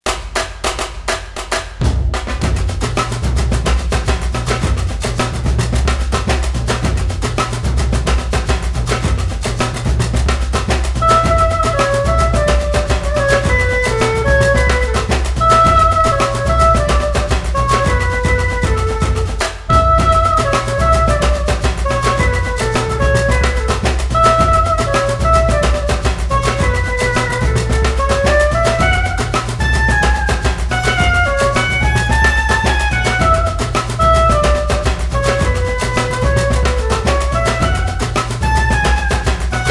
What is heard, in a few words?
Samba Funk